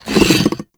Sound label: arm drag; Warframe; Man In The Wall